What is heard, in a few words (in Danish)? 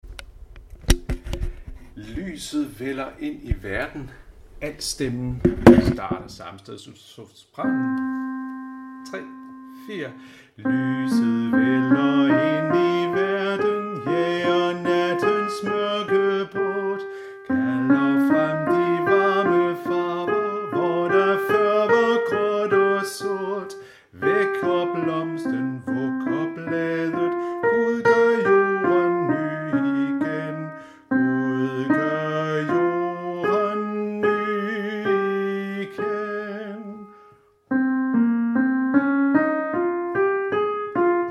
Lyset vælder ind i verden alt; harlev koret